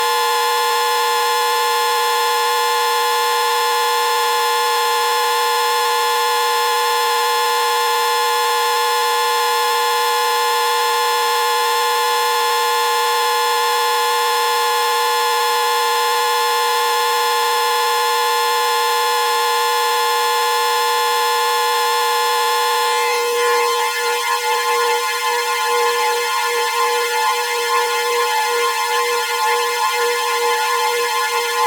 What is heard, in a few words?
chainsaw